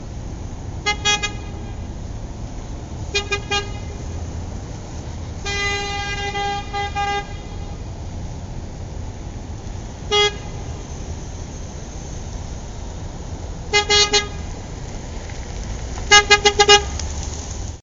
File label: street sound